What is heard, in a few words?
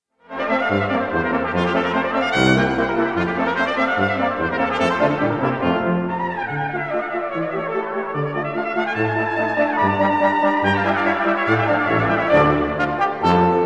rondo alla turca from piano sonata in a k331; wolfgang amadeus mozart